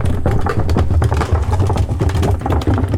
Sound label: Door Wood Roll Loop; Doors